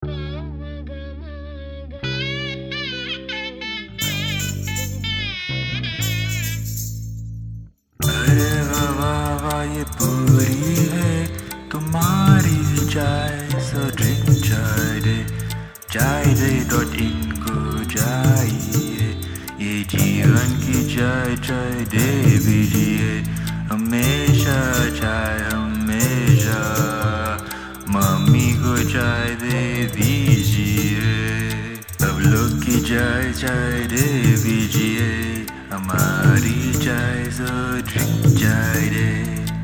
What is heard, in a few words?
jingle